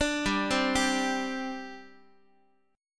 G Suspended 4th, Guitar; Gsus4 arpeggiated; x,x,5,5,4,6

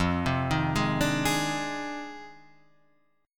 F Minor 13th, Guitar, Half Step Down

